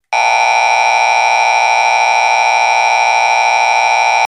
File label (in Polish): ton